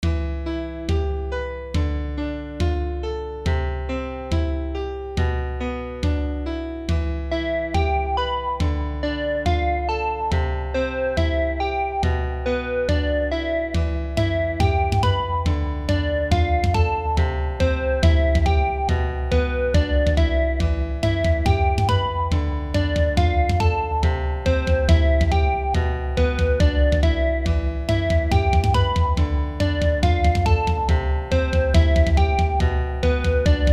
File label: drums